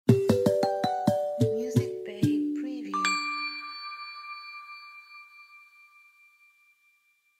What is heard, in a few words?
Audio Logo